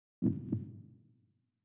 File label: heart; sprt drac